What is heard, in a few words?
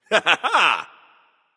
"yay"; smite; merlin